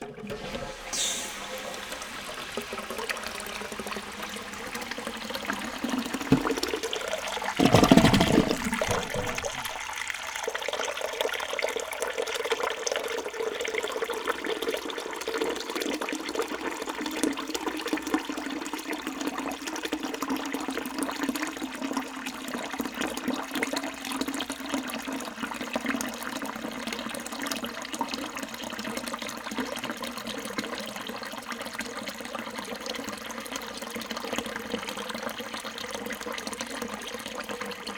Toilet Cistern

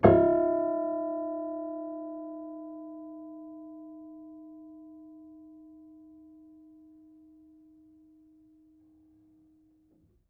Harm Low, Piano Harmonics

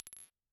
Bottle Struck

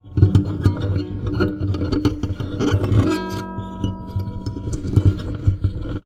Classical Guitar Extended Technique

